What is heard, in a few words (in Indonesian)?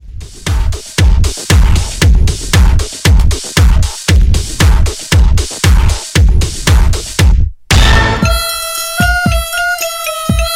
Nada Dering Anime; Mob Psycho 100